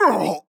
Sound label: Death; Paladins